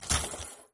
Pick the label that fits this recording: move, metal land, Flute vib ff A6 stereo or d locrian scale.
move